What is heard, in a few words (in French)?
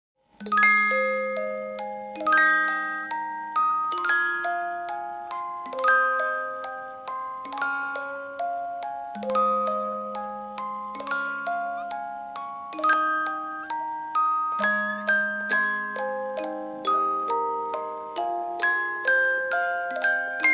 mylodie classique canon 30 lames